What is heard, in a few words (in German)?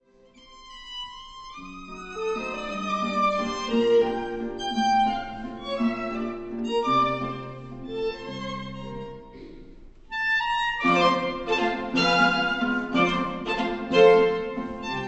Dampf Walzer; Joseph Lanner, Arr. Martin Kubik; Wiener Künstler